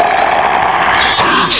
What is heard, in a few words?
dragon talk